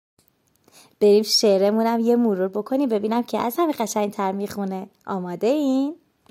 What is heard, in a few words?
sing